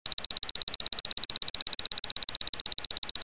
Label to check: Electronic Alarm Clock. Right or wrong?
Right